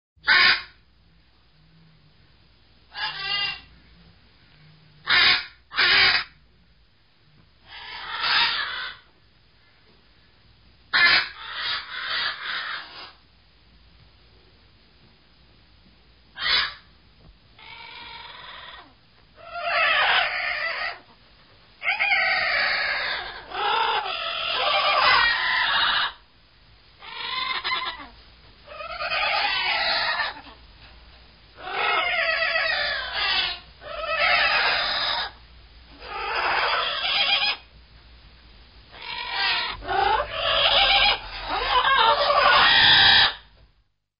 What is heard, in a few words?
birds sounds; taa titi ta voice book